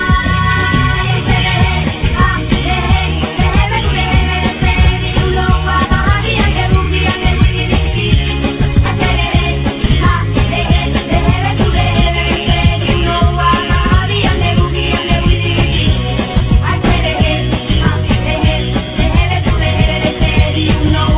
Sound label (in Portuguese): refrão espanhol